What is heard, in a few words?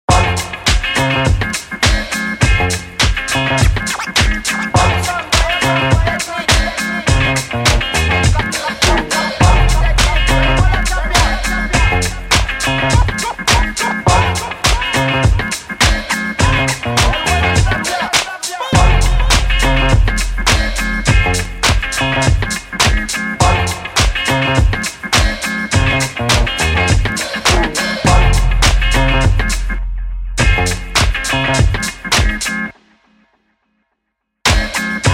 Instrumental